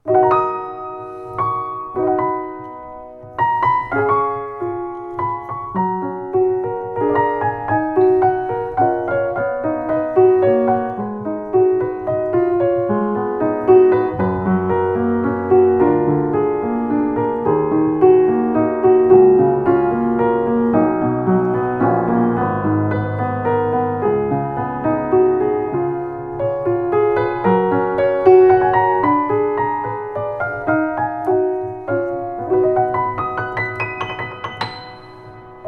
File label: Yamaha C109, piano